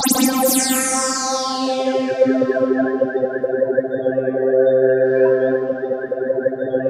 C4, SynthLine; 90 s Sample CDs; NiceBeats; SynthLine VOL-2

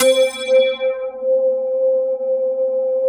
RATTLE BASS; Greytsounds; Scott Peers Sound Engineering

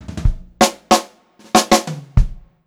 FILL, Pop-Rock Drumloops; USB Soundscan vol.37; AKAI; 96 POP

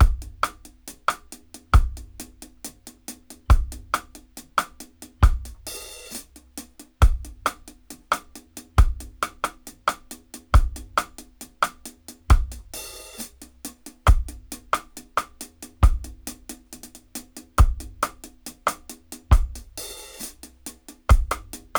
DRY, DRUM LOOPS, 068 BPM, Dub; Drumdrops In Dub VOL-1

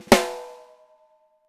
6X12 ALUMNUM, Living Drums

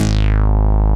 SYN STBAS; Sound & Vision - Gigapack I CD 2; JD 800/990

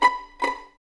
Violins B 5 Pizz; String Ensembles; Miroslav Vitous; 11 Violins

